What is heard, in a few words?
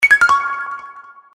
Puppy; PlusFourSix; Sania; Lars Edvall